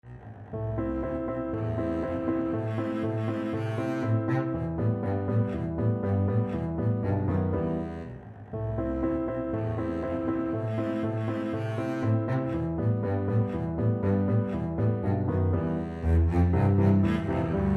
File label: mozart turkish march double bass